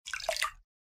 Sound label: Scoop water